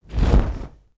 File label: Parachute Open; Sid Meier's Civilization 4 Complete; Beyond the Sword; Units